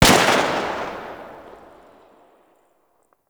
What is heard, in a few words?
Pistol Tail, Weapons; Warframe; Grineer; Kohm